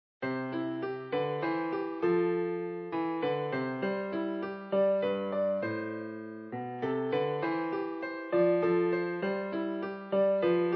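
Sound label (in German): 4 händig; Stille Nacht heilige Nacht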